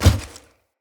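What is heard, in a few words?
Heavy Grunt Boot Walk Vegetation, Footsteps; Enemy; Sinecure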